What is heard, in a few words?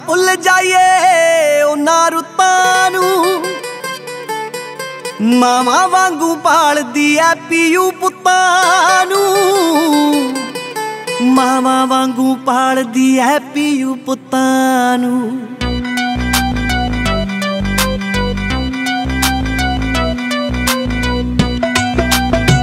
Punjabi Song Ringtones